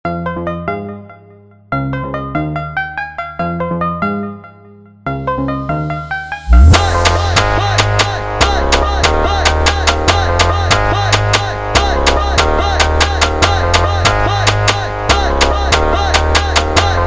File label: Montagem speed Up, Phonk Mashup; funk